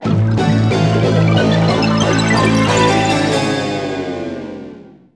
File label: Ditty